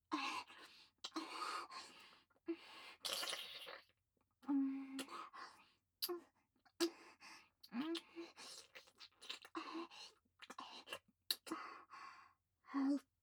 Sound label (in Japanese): 乳首舐め; アイリス